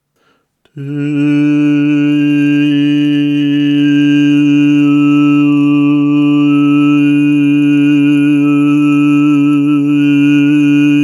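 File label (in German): Hörtest Tonbeispiel ohne Konsonanten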